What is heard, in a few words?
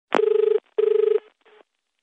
Phone Ring Tone